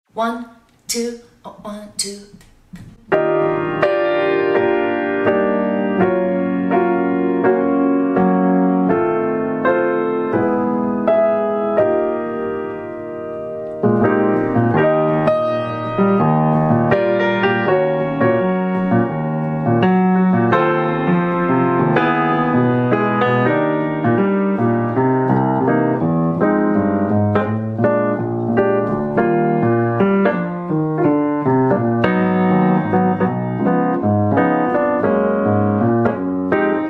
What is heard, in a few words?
accompaniment, Eb